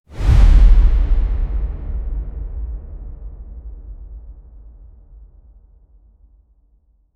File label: a powerful dark swoosh, air rush